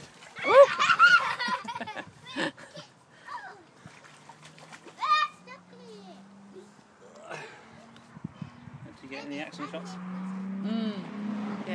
Sunday afternoon paddling pool splashing action

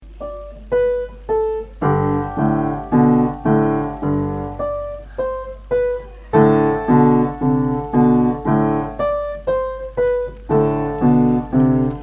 8 yr old offspring playing the piano

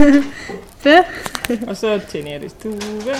booh

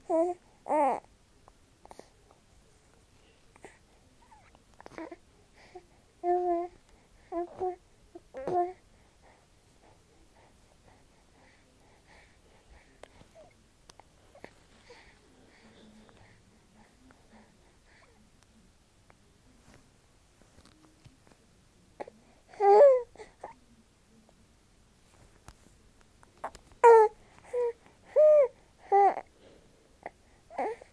gurgling cuteness

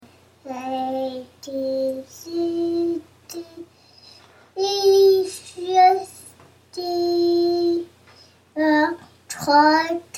baby sings abc s

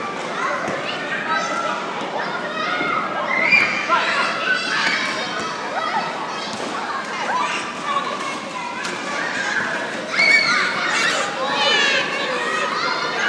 the painful sound of children's party joy

